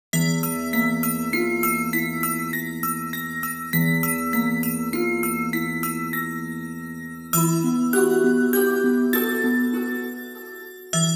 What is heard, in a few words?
Scary House